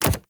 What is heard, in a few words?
Companion Step Default, Pets; Warframe; Characters; Zanuka; Foley